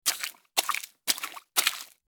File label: footsteps in puddles